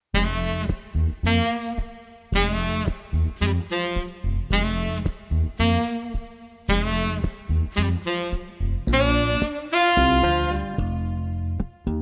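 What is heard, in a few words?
Street Sax Combo Funky Soul no Drums, 110 bpm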